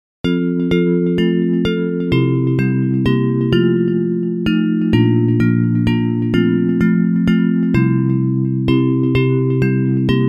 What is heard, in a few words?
Bells, Traditional hymn